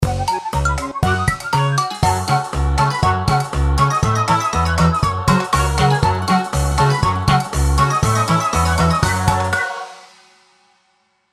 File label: game complete; Angry Birds